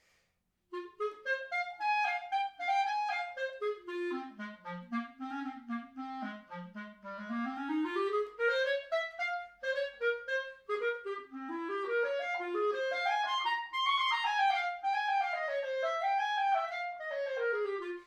clarinet

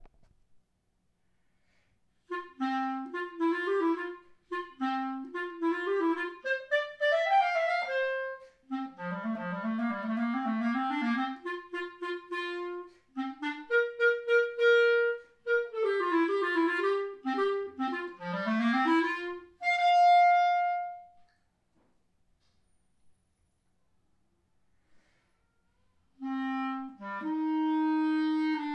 clar

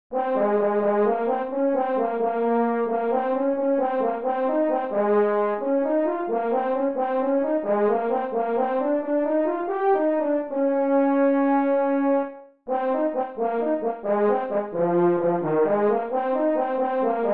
Horn

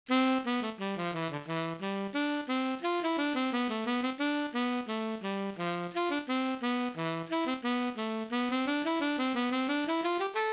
Tenor Sax

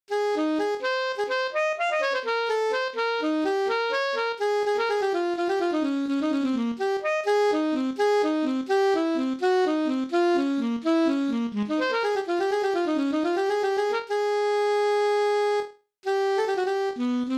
Alto Sax

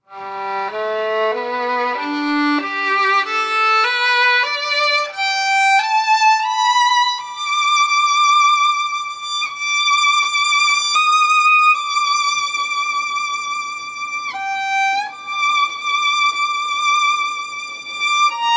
Violin; All-West 2019; Vaughan Williams

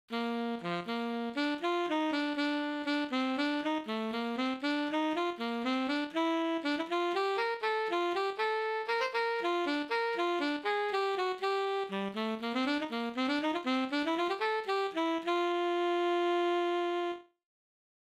tsax

